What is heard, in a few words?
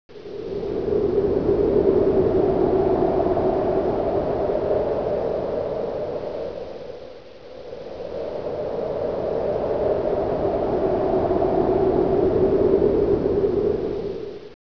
wind